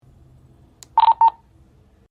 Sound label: talk permit tone